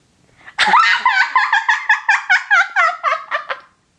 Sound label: laugh; deranged black girl